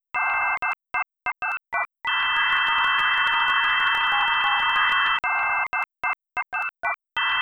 dialup

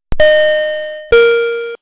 high chime low chime

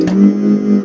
movestone; mesecons; movestones

